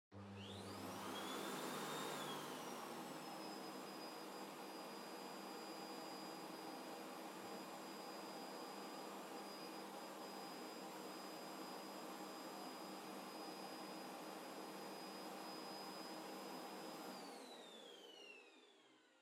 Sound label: Vacuum Cleaner Far Vacuum Floor Soft Low Still; 掃除機; 遠い バキューム 床 ソフト 低 静止